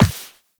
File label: Operator Walk Default, Footsteps; Warframe